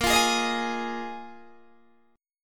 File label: A sharp 7th Suspended 4th down Guitar DADGAD